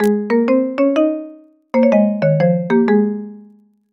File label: game jingles; Little Touch LeapPad